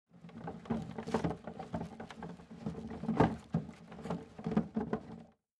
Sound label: Wheelbarrow Roll; Fairlight Sound Lib